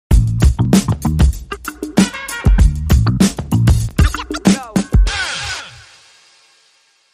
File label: Bass, Stem